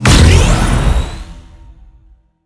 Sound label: level up; cstrike; Valorant